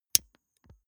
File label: lighter electric switch flame on go out